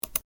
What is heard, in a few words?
click